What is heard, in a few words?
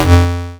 button